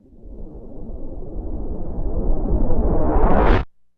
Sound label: IMP RUSH, Cinematic Impact; M-Audio Pro Sessions; Liquid Cinema